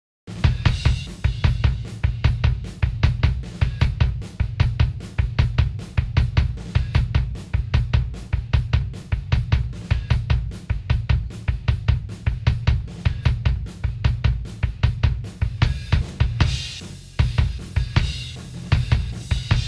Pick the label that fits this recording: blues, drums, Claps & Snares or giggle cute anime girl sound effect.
drums